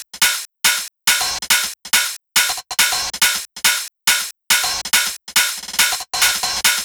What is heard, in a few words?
Loop 140 BPM; vengeance essential club sounds-3